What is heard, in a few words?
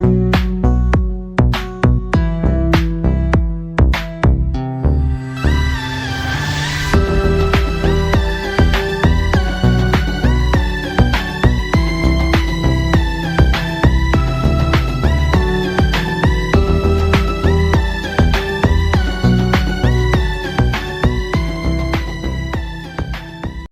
piano cover